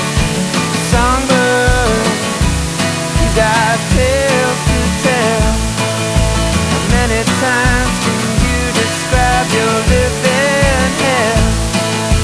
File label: segment plus noise